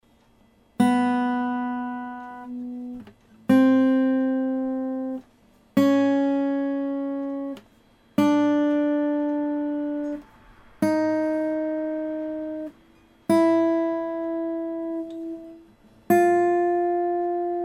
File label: yamaha after, guitar vibration